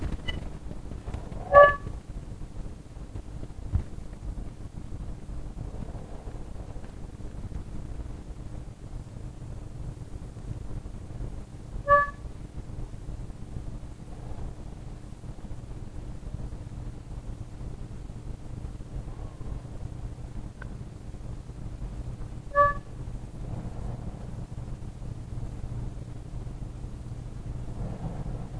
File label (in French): bruit toit maison